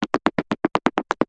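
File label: Footsteps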